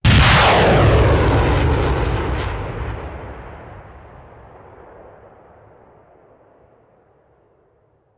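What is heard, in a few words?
Artillery Missile Launcher; Sound Ideas; Guns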